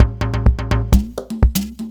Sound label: PERCLOOP HOUSE 125; eJay